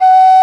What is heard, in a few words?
Penny Whistle; Winds; E-MU Producer Series Vol. 7 – Old World Instruments